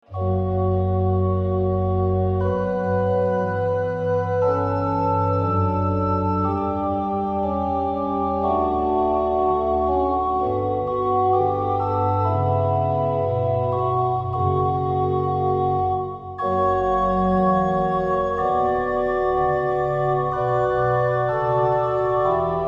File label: passion chorale